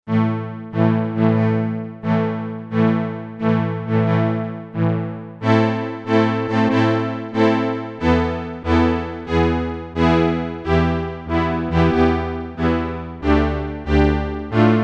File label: strings, Korg DW-8000; Factory Patches